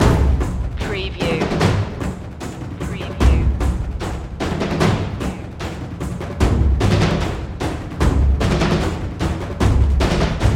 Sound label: taikos loop